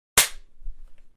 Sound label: clapper board sound